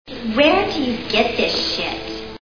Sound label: scream